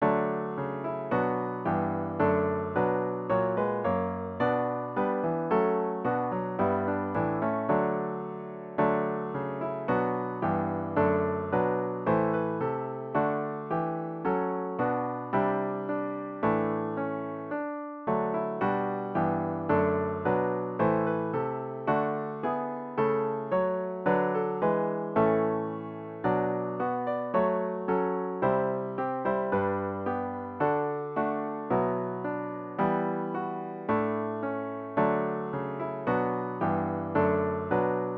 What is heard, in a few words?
We Have Come at Christs Own Bidding piano with Intro